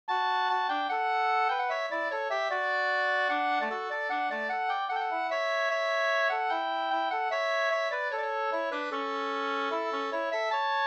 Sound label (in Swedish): schottis; Porjus